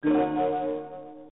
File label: Credit SFX; R-Shark; arcade gamerip 1995